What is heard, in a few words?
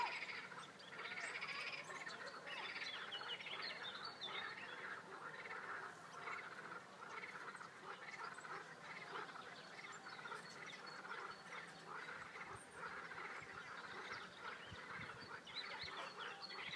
Voice of Frogs and Birds in Sunrise